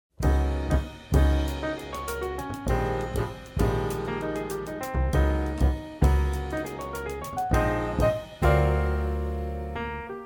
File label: jazz trio nocturne